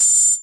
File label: Syn Dr, Overloaded Synthetic Drums; style kits